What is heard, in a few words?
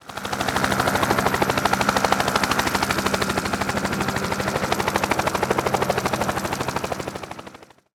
Heli